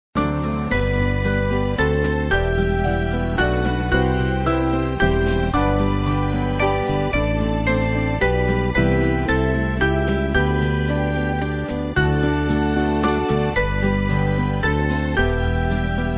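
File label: Band, Music Backing; There Is A Fountain; Belmont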